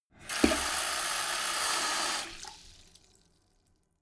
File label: sink fill splatter hard short